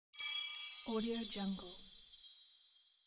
magic christmas; umcsound

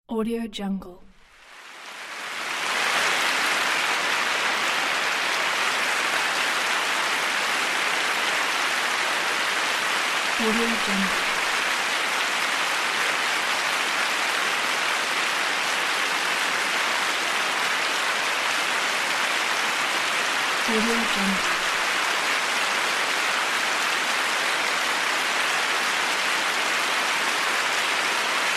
applause in the theater

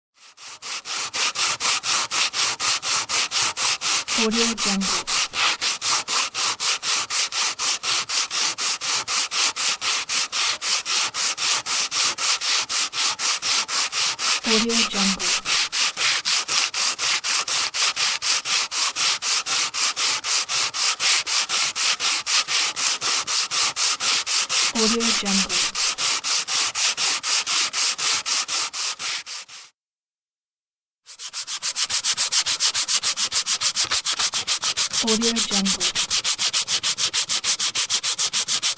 sandpaper large; palmirasoundstudio

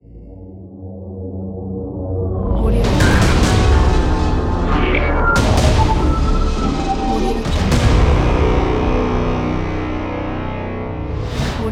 post apocalypse logo

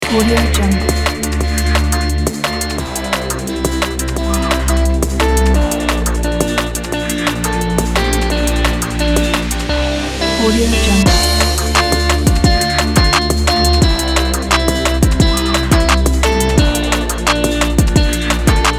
summer pop guitar background; jgork

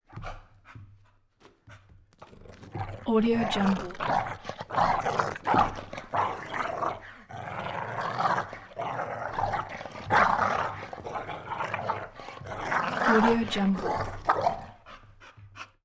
dog snarling

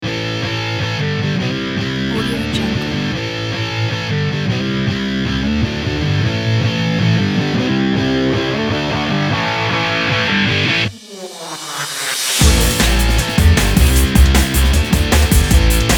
action sport podcast

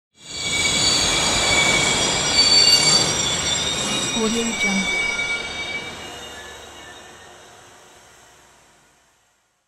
train stopping

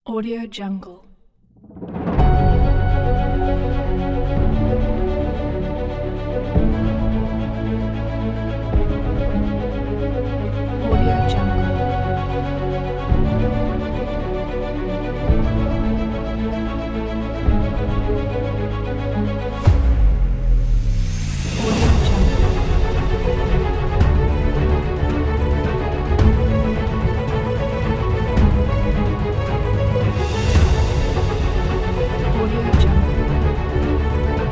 memorial independence day

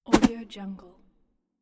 double whoosh

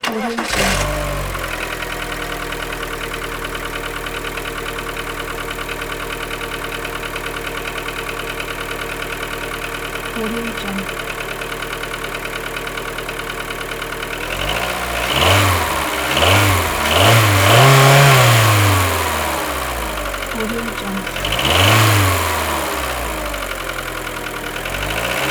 the car starting

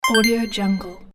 8-bit answer correct

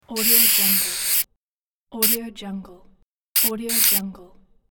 spray; juicycorp

